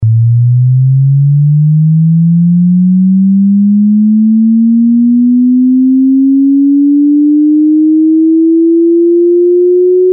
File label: sweep 110-880